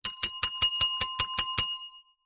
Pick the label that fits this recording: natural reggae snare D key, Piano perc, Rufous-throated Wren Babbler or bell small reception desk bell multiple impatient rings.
bell small reception desk bell multiple impatient rings